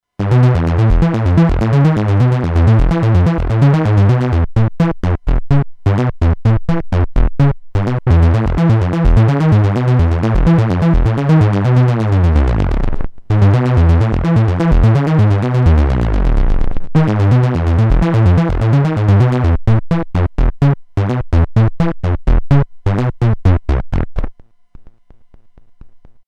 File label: Acid8 MKIII Stutter Spindown